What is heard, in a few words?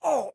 hit, damage; minecraft